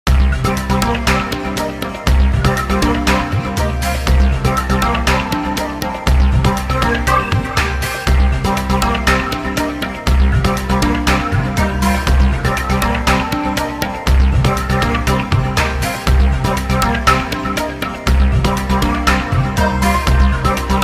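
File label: Forest theme; SMM2; NSMBU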